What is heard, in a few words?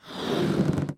boop